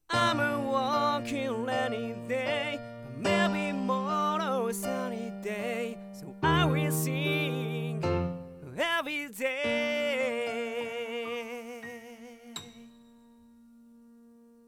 piano Dual Mono Mix

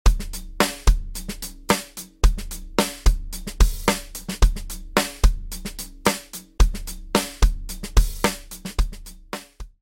Worldmax with Sticks; BFD拡張音源

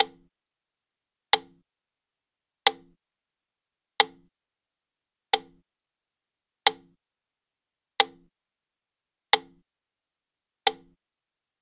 metronome; teenageengineering ob-4; Bluetooth対応「魔法のスピーカー／ラジオ」

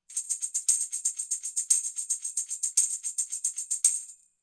Tamb Dual Mono mix; LCT040

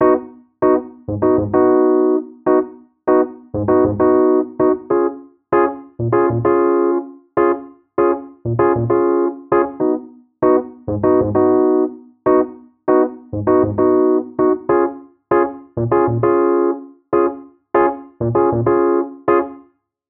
delay echoes freak delay dry; aas; objeq delay

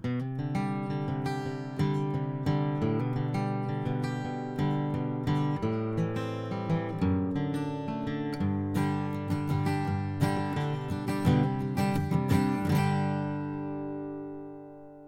Acoustic Guitar; earthworks; QTC40 アコースティック・ギター; QTCシリーズのスタンダード・モデル

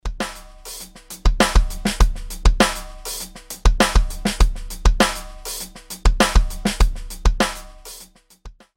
snare with Sticks; GMS; BFD拡張音源; fxpansion; snare selects

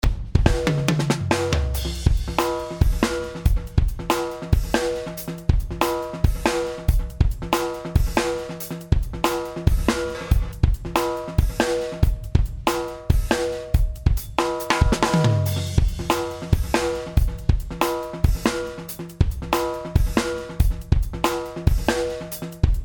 EDM 140bpm

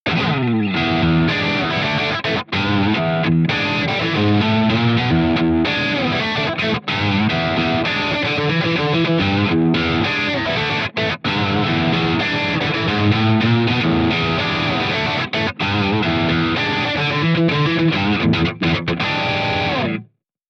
Vintage Drive

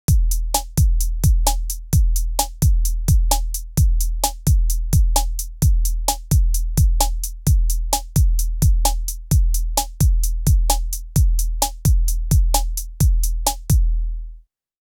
Drum machine

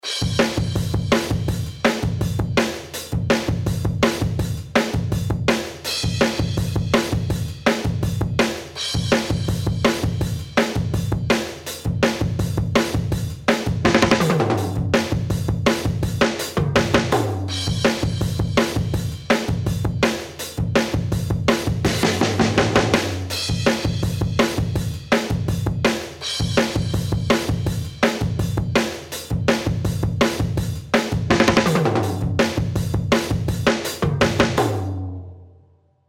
EDM 165bpm

